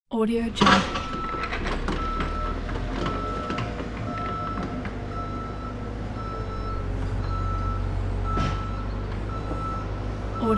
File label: digger machine working loop